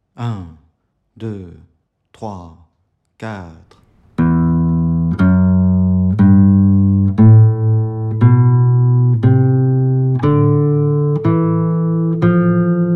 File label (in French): exercice 060bpm